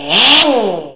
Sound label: stand up; hurrican